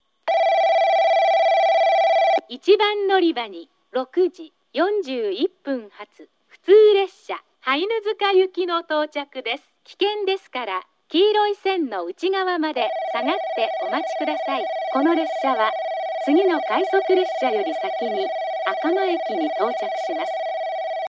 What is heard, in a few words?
kurosaki local hainuzuka, portable recording; 1番のりば接近放送（普通 羽犬塚行き）; →このような先着案内は、黒崎駅でしか聞いたことがありません。; ※次の快速列車より先に、赤間駅に到着